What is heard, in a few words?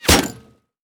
Fan